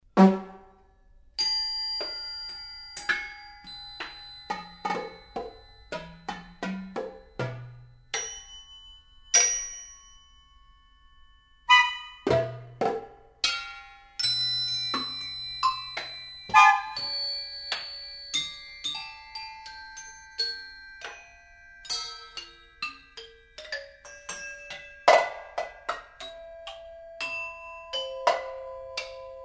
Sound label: horn bell drum